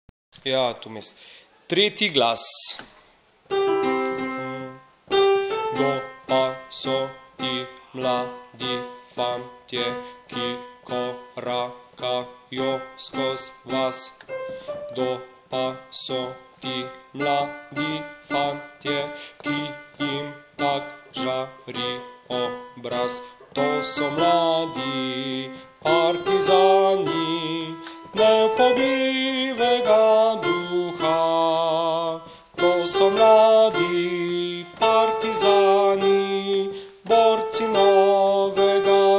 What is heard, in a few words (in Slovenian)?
3glas; nasa vojska